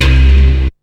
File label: BASS; SoundFactory; SMPMANIA